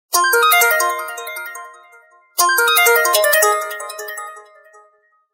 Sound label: Animato Tone, Samsung phone tones; SGH-T669 Gravity T